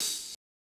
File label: Open Hat Hit; 080 Cmin; Hip Hop Exotica; Big Fish Audio